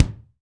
BD; Best Service Gigapack 2

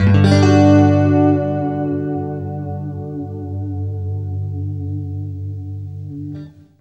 FLESH CHORDS, Guitar

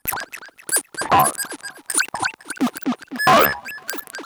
Droids

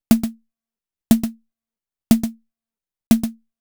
Anabeat Snare; Sonic Foundry (Sony Creative Software); Prototechno

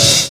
CRASH CRO; Univers Sons - Kitch 70s

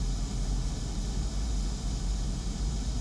Ambience Loop, outdoor

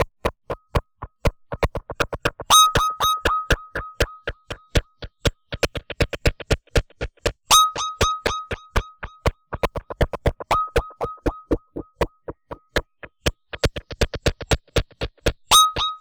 Structured Rhythm, IDM Rhythms

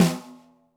Snare, Roland TD-20

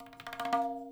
FILL, Percussion

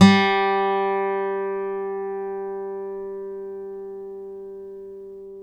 STEEL P G2, Synclavier, GUITARS; Synclavier Sampler Library

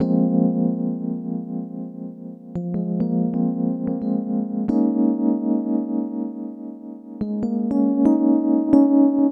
Keyboard; Sonic Foundry (Sony Creative Software); Infinite Soul VOL-2; Keys

